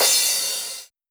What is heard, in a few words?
crash cosmic